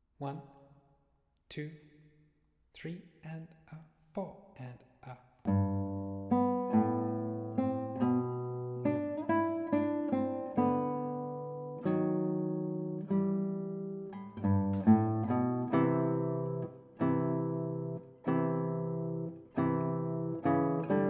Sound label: Duet in G, guitar; Carulli